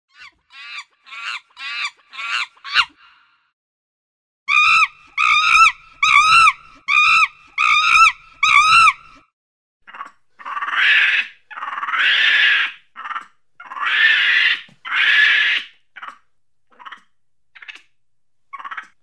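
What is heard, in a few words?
sounds of monkeys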